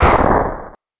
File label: fall; Alien Arena; firebrand